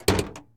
Truck Expedition Hood Latch; Trucks

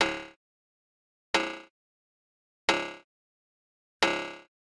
Generic Click Digital; boom library; The Interface

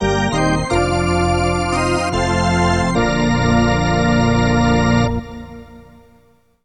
Hockey Organ USA

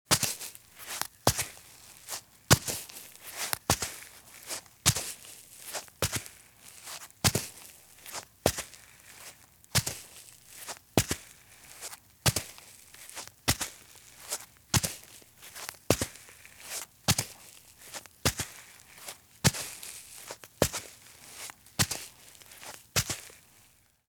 Flip Flops Walk at Slow Pace on Sand; Sound Ideas; Footstep Sound Effects

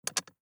DICE on Glass, Grab, Standard, 5 Five Dice; Samples; ArticulatedSounds; DICE Sfx Party (Extended)

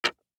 DICE on Hard Wood, Drop, Standard, Four Dice; Samples; ArticulatedSounds; DICE Sfx Party (Extended)

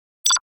computer bleep; NCH Library

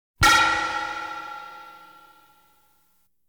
Science Fiction effects, Lasers, Light beams

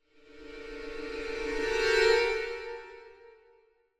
Symphonic Hit Passing Violin Duet Lower; Hollywood Edge; sound designer's toolkit v2

